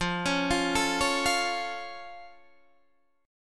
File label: F Augmented Guitar Open D